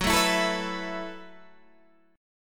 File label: A Suspended 2nd, chord; Asus2/F#; strummed; JGuitar